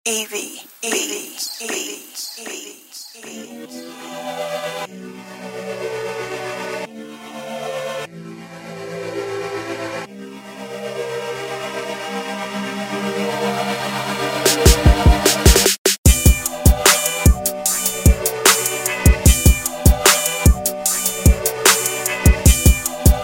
Beats

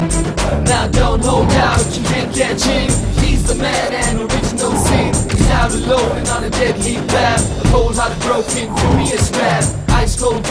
rap; Velayutham

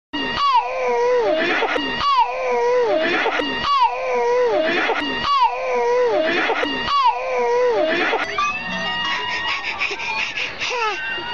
Baby Howling

